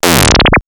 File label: Ignition, sci fi synth fx; X-Static Goldmine